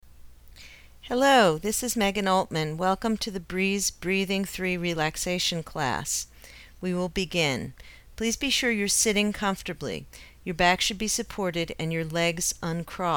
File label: breese breathing three